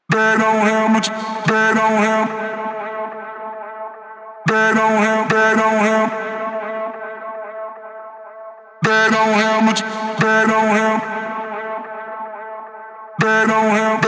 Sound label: 110 BPM A Vocal Loop